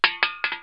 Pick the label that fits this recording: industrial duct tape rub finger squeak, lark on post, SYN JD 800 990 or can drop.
can drop